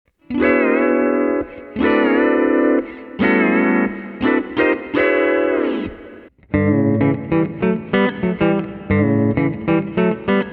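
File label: More Low String Sounds, D13 steel guitar; D13 Copedant Tabs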